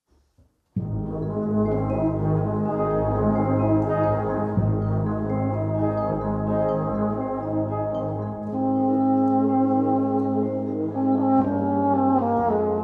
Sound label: La Venus (Alto Solo)